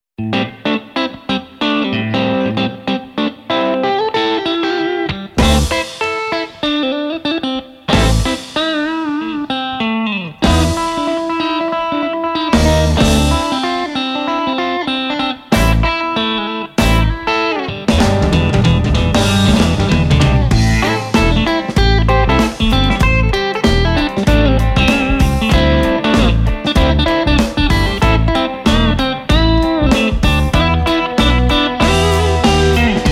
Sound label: blues